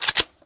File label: vss switch, weapons